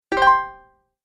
Great Idea, cartoon; Cartoon Sound Design Sound Effects

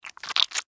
Horror Squish Suction; Monster Sound Effects; Monsters (Sound Effects:Horror)

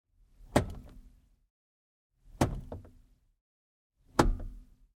Truck GMC Savana Gearshifts Lite; Vehicles; Trucks; Big Room Sound

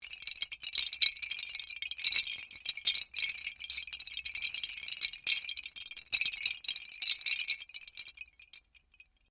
Windchimes Bamboo Small Long; Various windchimes; Windchime Sound Effects; Musical

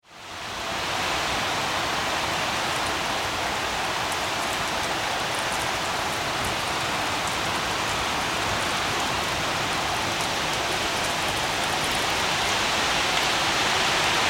Weather Rain Pour City Ambience

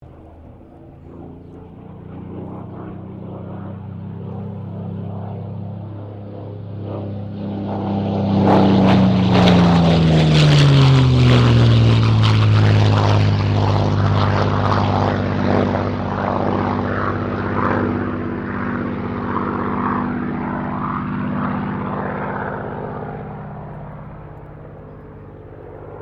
AIRCRAFT SUPERMARINE SPITFIRE MK-VIII PASS; Airplanes

